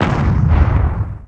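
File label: EXPLOSION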